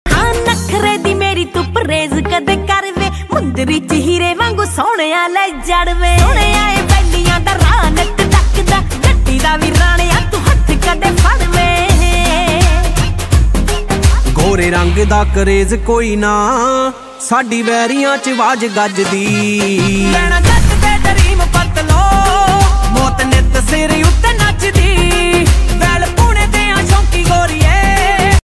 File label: Heart Beat Dance Punjabi